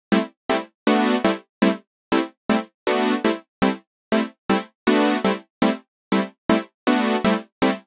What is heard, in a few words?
Chord Synth Am, 120bpm; Zero-G; Extra Chord Progs